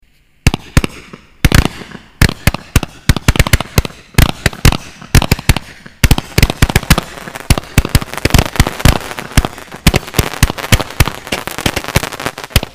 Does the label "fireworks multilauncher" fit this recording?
Yes